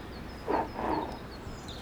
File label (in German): Nashoerner Threat; Breitmaulnashörner (Ceratotherium simum simum)